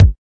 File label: kik